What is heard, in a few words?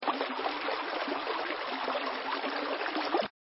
spring